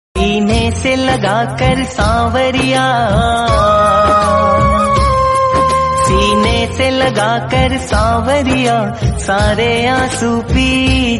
Bhagwan Alarm

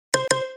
Lg Q31 Notification

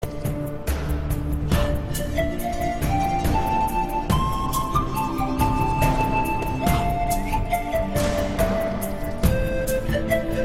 Oppo Flute

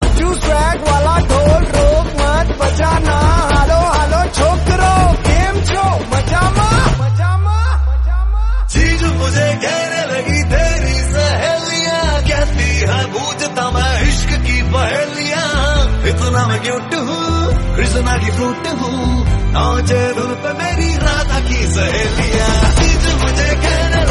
Dhol; Gujju

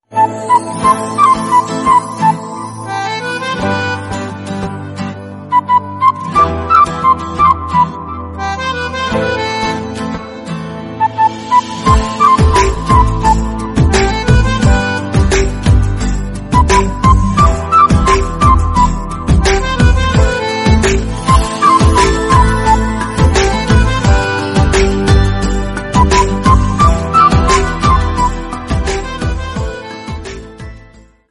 Song Flute, ringtone

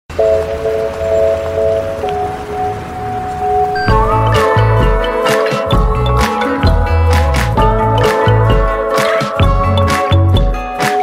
Huawei 20 Pro, ringtone

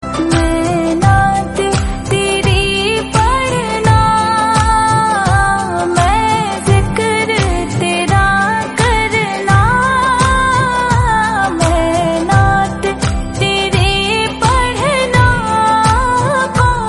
Naat Emotional, ringtone

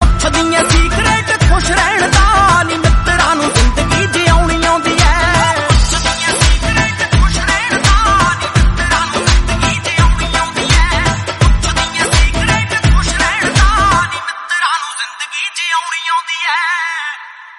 Bgm

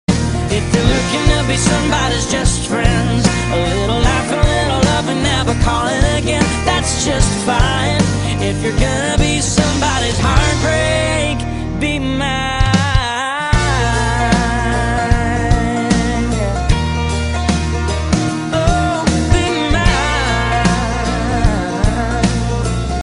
Motorola, ringtone

